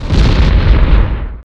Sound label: nuke; SuperPower 2 Redux V7A